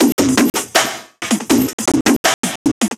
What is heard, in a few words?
Break, Drum N Bass Percussion